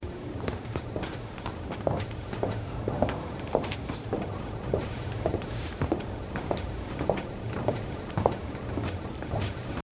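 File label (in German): Schritte eines Astronauten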